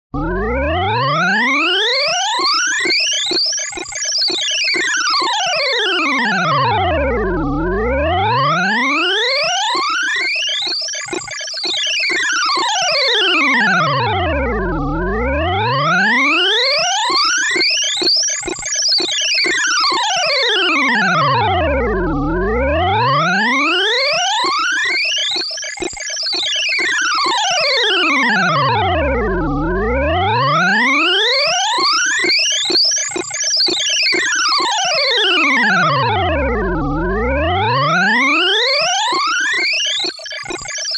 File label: Monster Scales